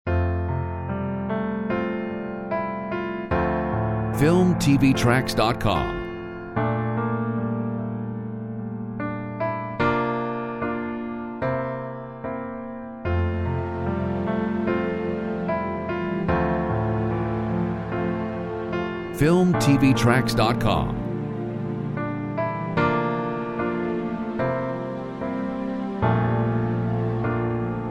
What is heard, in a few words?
Romantic Drama